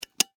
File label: Household Pesticide Can Open; Yard Tools; SFX Bible